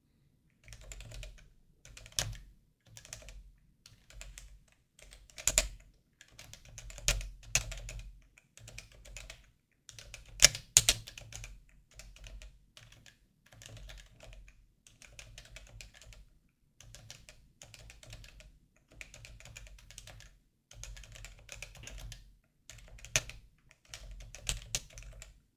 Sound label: topre realforce typing sound